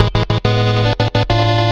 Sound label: boss level, Electronic Loops